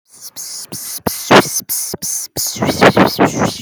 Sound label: wisper